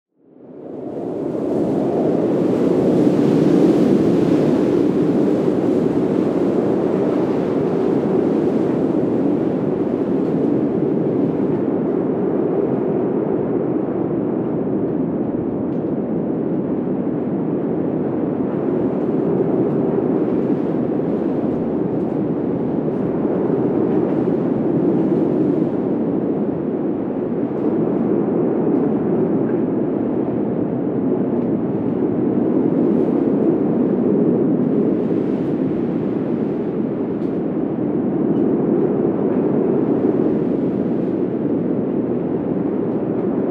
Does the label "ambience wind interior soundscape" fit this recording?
Yes